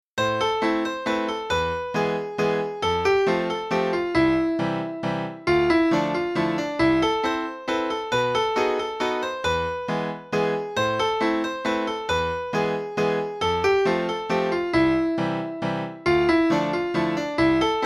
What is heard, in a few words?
A minor Waltz